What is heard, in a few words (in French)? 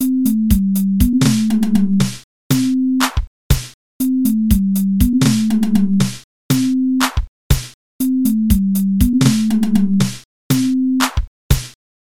carillon